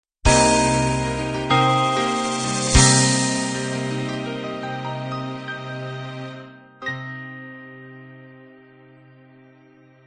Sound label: love ballad end